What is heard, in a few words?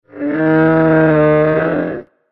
Plateosaurus Roar; dinosaurs